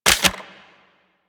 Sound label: Auto Crossbow Equip; Warframe; Tenno